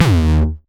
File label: 909 Grime Layer 1 - D#2, Kicks; Mbase 11 Kicks